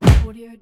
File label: enemy punch